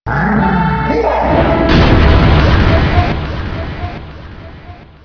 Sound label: fire; koopa; cstrike; ze mario tower